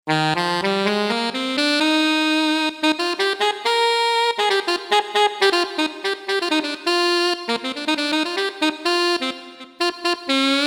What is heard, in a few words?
Alto Sax, Roland JV-880; Factory Patches